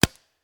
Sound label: paintball